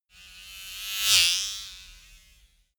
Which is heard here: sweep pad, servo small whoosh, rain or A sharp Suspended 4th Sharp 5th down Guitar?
servo small whoosh